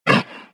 land; galak

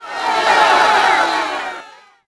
CROWD NEG HIGH; Cars; XBOX

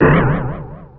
SHAKE; Puyo Pop Fever

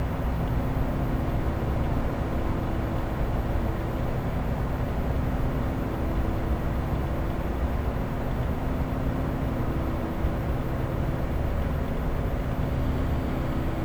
ambi air; Terminator 3 Rise Of The Machines; XBOX

